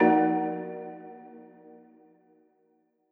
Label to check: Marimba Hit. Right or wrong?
Right